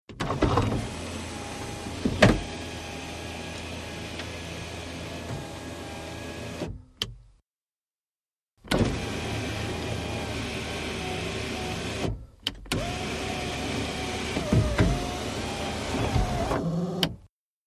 Montero Moon Roof, 1998 mitsubishi montero int.

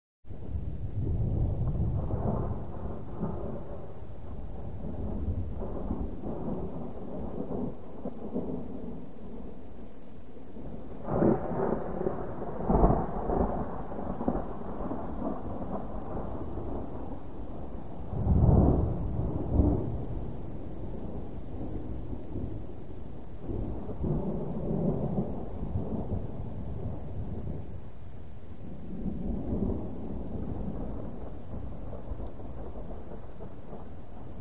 Thunder Medium Distant

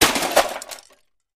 Vcr Drop On Cement; electronics

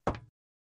Metal Loafer Left, footsteps metal

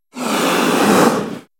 Lion Hiss Single Deep; big cats

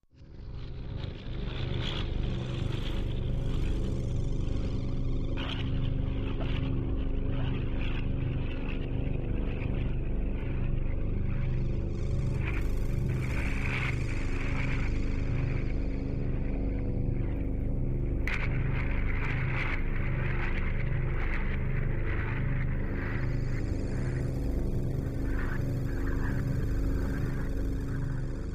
Bugs In Engine Elect Bug, eerie ambience

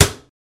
Cabinet Door Slam Cl

